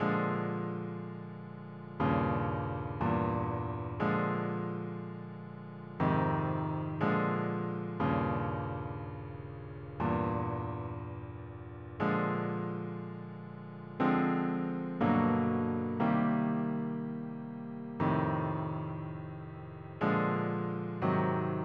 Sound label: Common Alterations blues